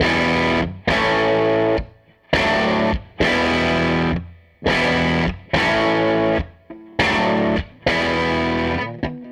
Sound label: Gretsch Guitar Loop